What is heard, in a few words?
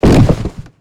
fall special; Quake 4 Alpha; strogg tik